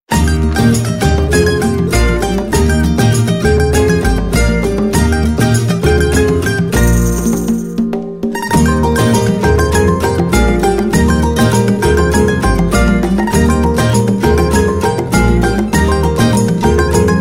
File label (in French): MARCHE